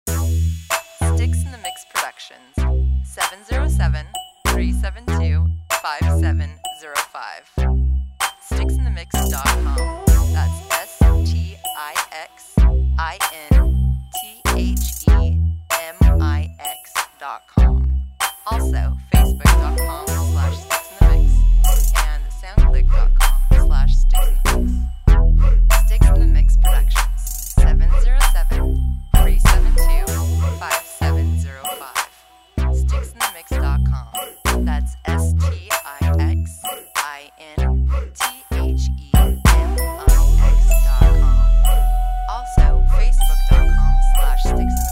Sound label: Bell